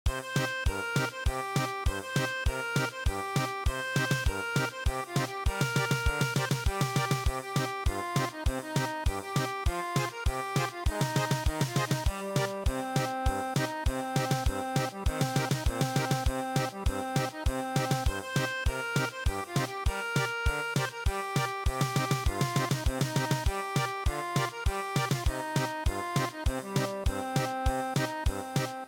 arirang German Polka; traditional

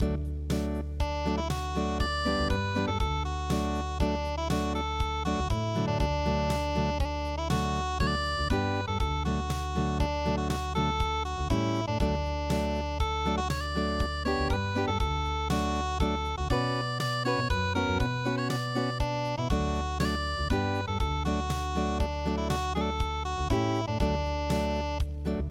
The Last Rose Of Summer unpluged

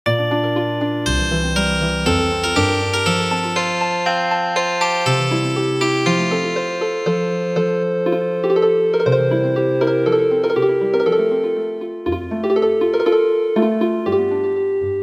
Healin Harp

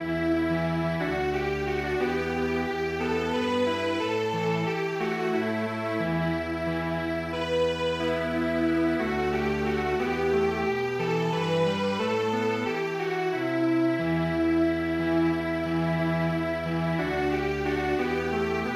Garba Dance Song Strings Quartet

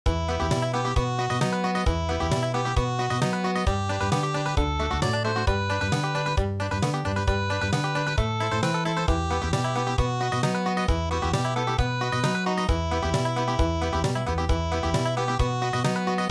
Country

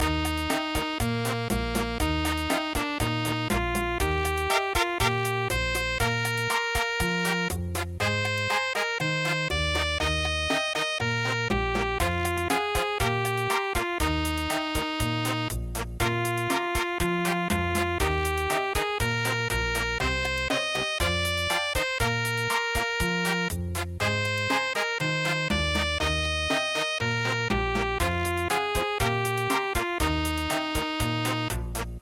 chachacha; wareha

